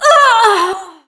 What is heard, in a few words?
female death, human; cstrike; Ghoul